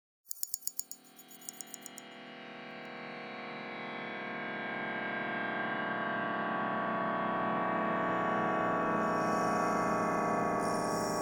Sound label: Chakras Ambience; electroacoustic